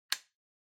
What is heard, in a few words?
Wall Outlet Dry Unlock